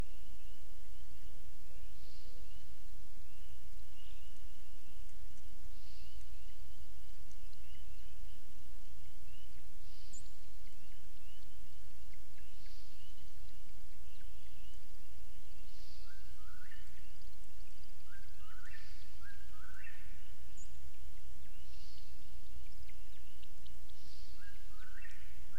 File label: Whippoorwills